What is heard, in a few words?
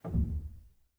rel, Grand Piano; bitKlavier Grand; Piano Bar